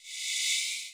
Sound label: SLIDE UP